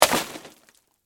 Water Balloon Splash, Water, Balloon, Large, Splash, Impact, Wet